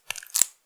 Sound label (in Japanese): くちゅ音単発